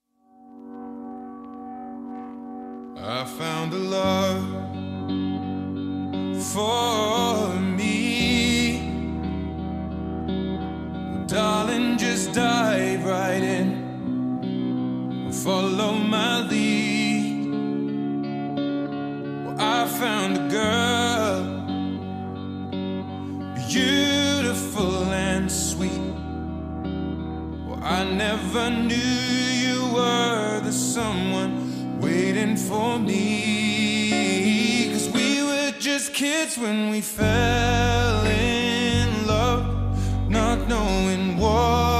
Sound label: slowed down to perfection reverb